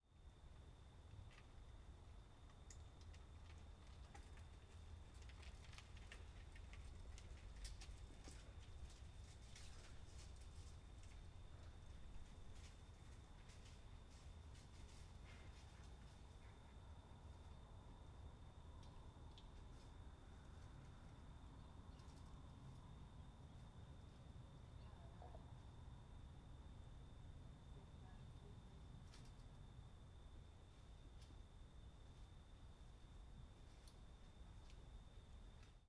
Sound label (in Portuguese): Ambiencia Rua Noite Ciclista Passando